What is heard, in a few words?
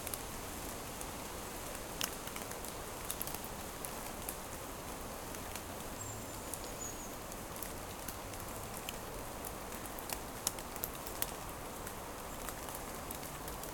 rain, shower of rain, steady rain, forest, leaves; Storm, gale and thunderstorm; Invisible